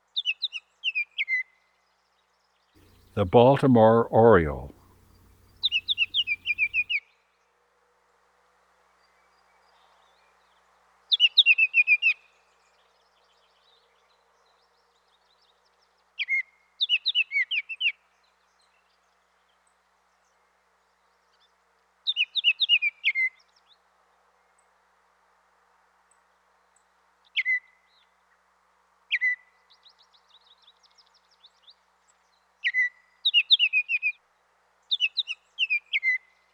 Baltimore Oriole; Eastern North America; Dan Gibson; Wildlife Identification By Sound

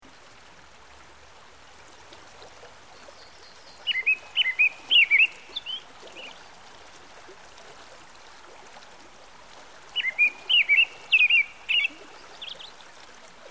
American Robin; Dan Gibson; Songbirds By The Stream

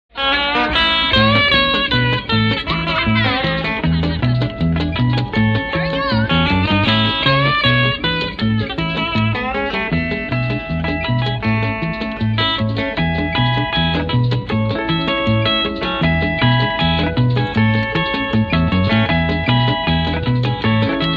chimes

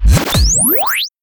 whoosh; Whooshes and sweeps

